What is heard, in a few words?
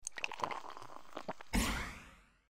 drink Mini Pot